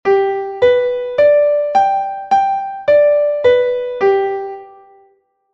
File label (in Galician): arpexio de sol; Escala Maior